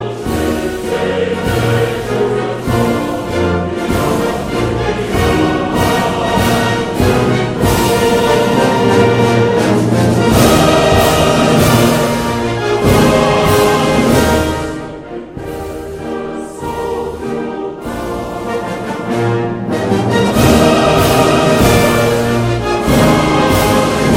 national anthem, ringtone

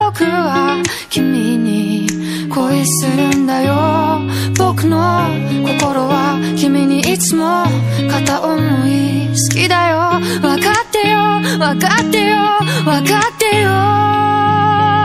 japanese ringtone

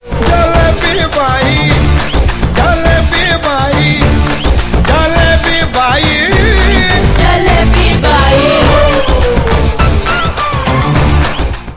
bollywood song, ringtone; sardarji; arsad warsi; double dhamaal 2011

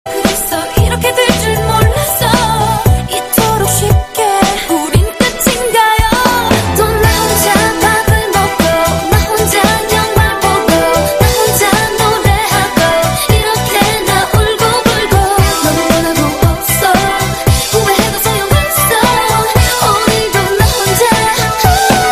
korean alone

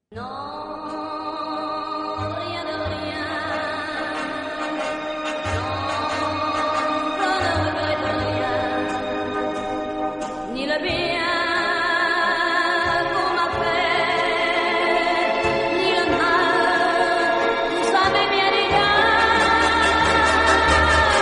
alarm

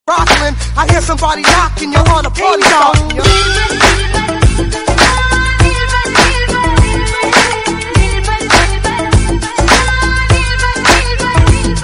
remix

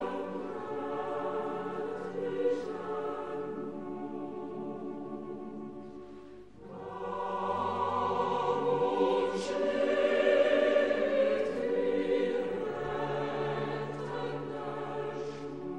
austria german ringtone

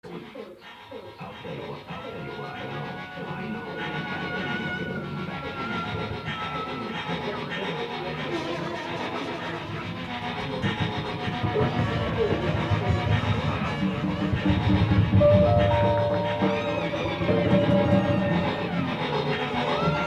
belgium horror

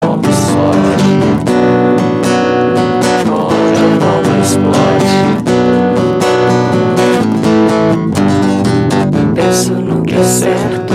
bomba ringtone

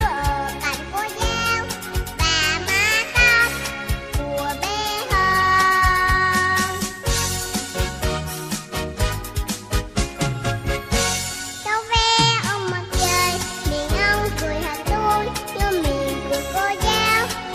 cool vietnamese kids ringtone

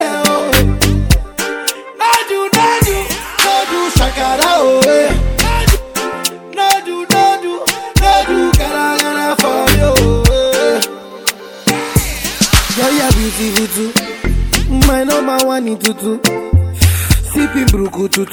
african ringtone

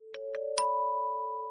notification

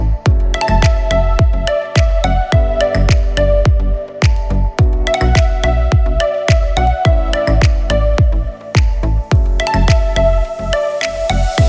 new dance ringtone

